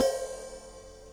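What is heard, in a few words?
crash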